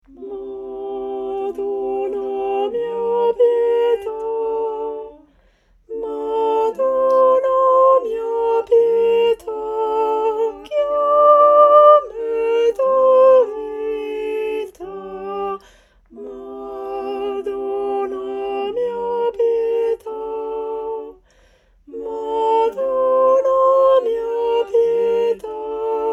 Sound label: tenor; Madonna mia pieta